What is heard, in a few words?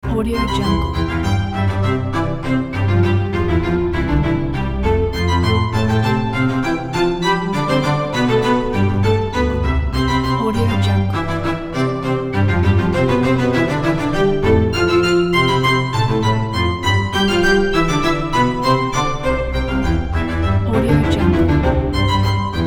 classical